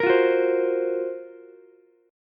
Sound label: Gb Major 7th Flat 5th Ukulele; GbM7b5 strummed; JGuitar